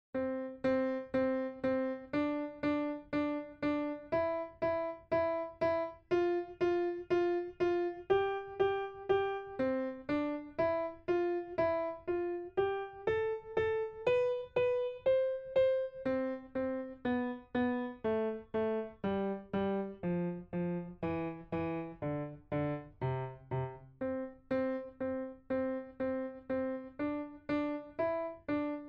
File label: piano; Exercise 7